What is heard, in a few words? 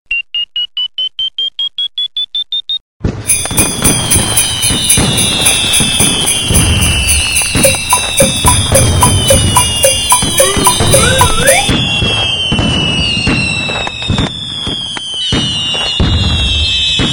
xmas fireworks